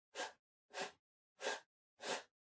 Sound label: PC Mouse Move Far Wood Short